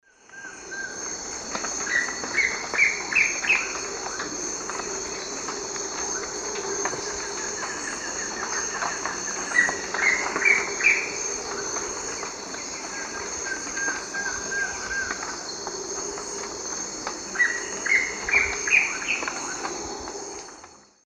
Cercomacra serva, subsong, Eastern Lowlands, S. America